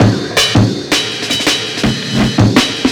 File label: Stone Break; Jungle; Breaks; Blu Mar Ten - Jungle Jungle - 1989 to 1999 Samplepack